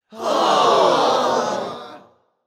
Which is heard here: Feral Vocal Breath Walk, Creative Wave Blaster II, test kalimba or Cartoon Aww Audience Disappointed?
Cartoon Aww Audience Disappointed